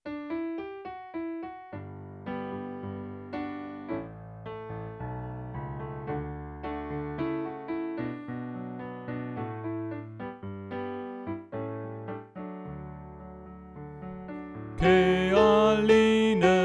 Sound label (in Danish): Sopran